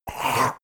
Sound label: monster hit gurgle; koerpergeraeusche; comedy-cartoon; soundpool